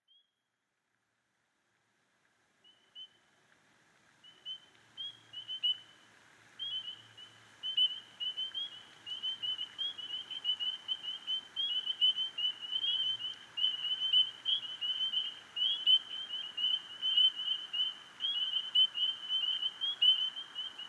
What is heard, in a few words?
The Singing Frogs of Adrien Michigan